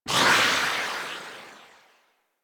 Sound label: Minigun Tail